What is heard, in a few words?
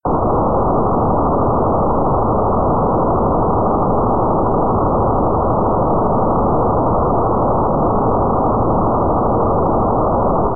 NXDN 6.25 audio; Uniden BCD436HP